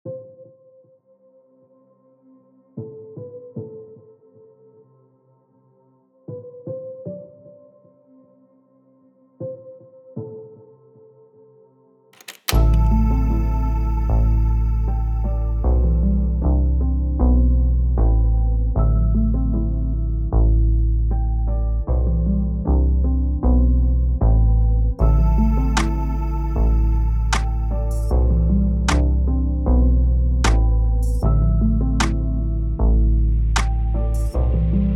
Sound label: 154 bpm Am